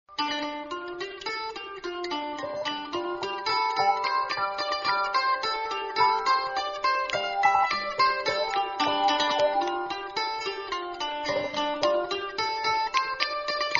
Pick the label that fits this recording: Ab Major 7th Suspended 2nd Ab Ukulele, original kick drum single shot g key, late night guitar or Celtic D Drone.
Celtic D Drone